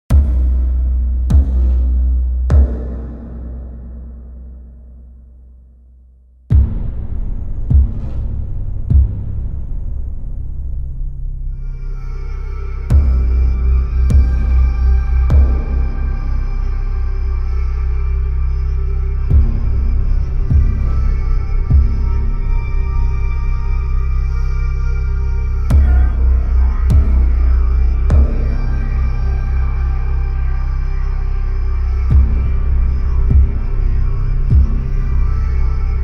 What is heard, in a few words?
the spell dark magic background music